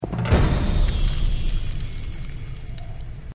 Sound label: quest updated; The Witcher